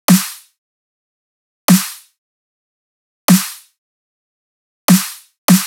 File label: Dubstep Drum Loop Snare, 150bpm; Stem Seperated